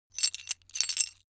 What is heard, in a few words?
metal pieces clatter short